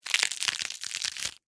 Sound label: Crunch Tear Slow, Cabbage, Head, Vegetable; Texture